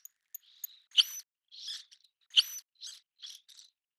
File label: whistles screeches audible